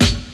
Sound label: clean snare one shot f sharp key